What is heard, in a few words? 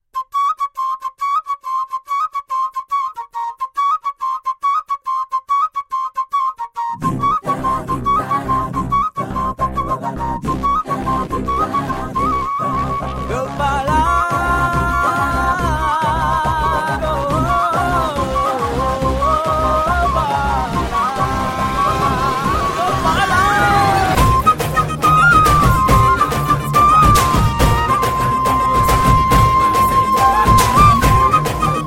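Flute Music, Telugu Ringtone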